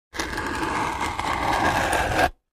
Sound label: 2x4 Dragged On Cement